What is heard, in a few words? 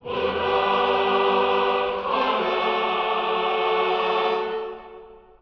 chorus